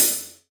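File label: open hi hat, Real Drum Kit; Best Service ProSamples vol.43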